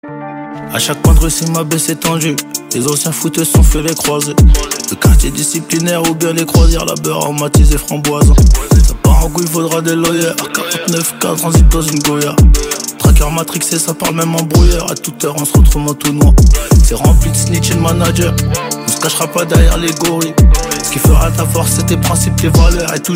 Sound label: freestyle